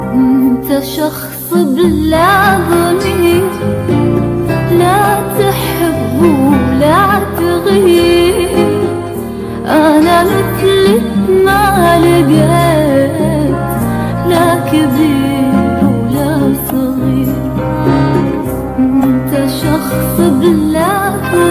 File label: Arab Sad